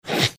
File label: snorting